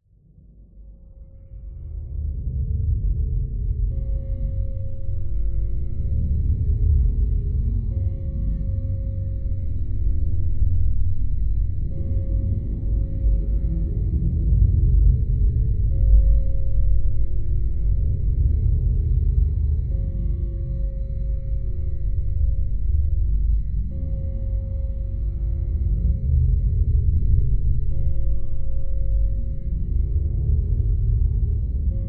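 science fiction forcefield energy source dark droning glowing dark chimes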